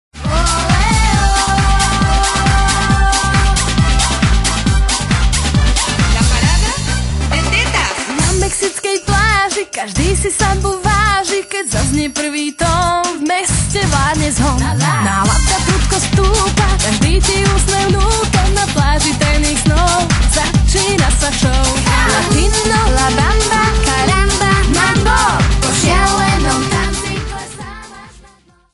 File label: samba mexicana